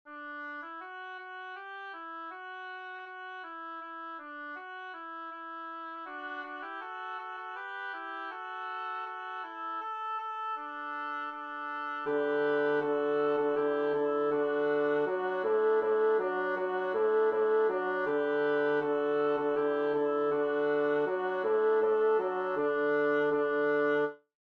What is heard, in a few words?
Donkey Riding low; shanty group